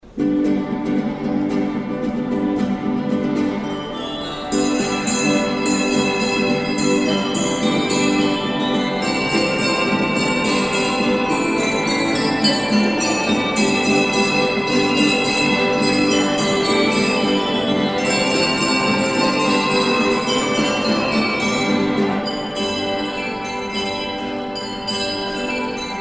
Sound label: Jingle Bells, campanari